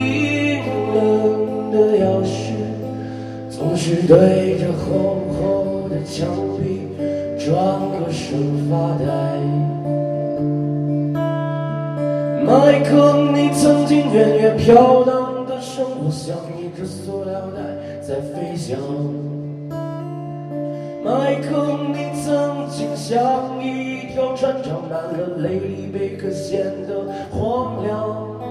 rock sino-tibétain; Chine

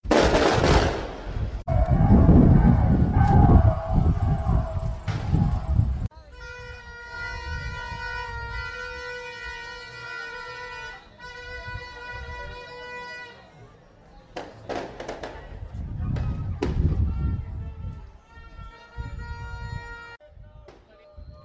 greve manif, Bolivie Santa Cruz

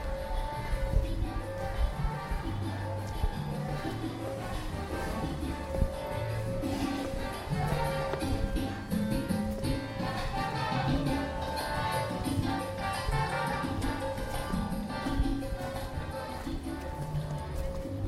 Bresil Rio Musique dans la rue

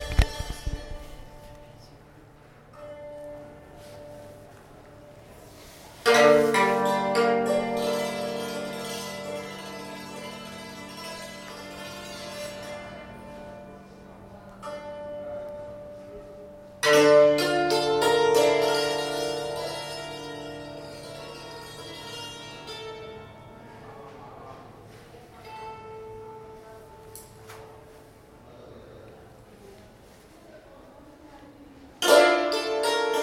musique meditative moderne; Inde

